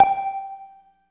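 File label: MARIMBA G2; East West - Phat & Phunky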